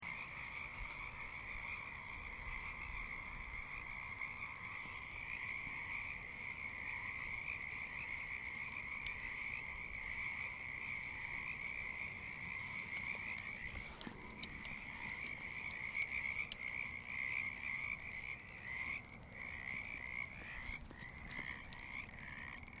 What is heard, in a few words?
Pacific Tree Frogs; wildlife viewing; frogs and toads